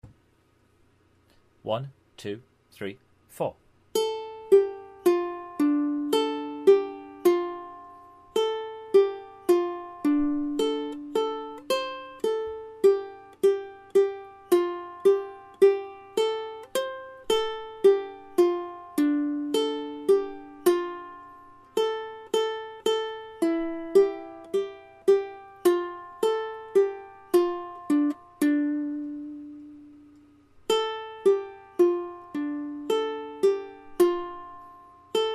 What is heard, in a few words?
Blues, ukulele